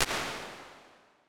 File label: 1.3s Conference Room, Impulse Responses; Medium Spaces; Indoor Spaces; Apple